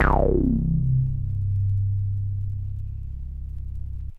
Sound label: CS5 Filter Res Vinyl Synths C0, bass